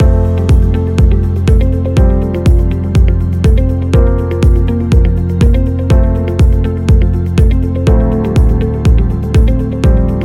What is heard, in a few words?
Positive Loop; service business